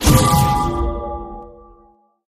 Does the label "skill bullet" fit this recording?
Yes